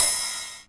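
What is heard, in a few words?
909 ride, TR-909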